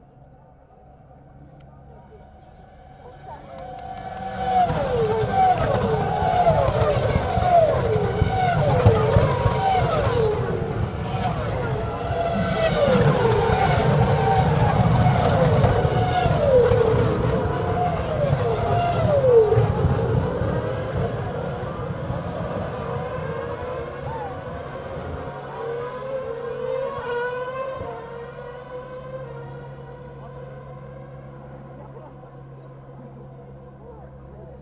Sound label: Grandprix Passing